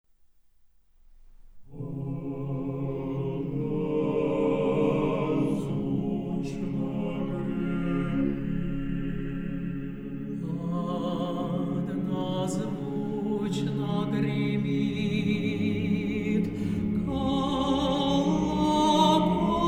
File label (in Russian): Хор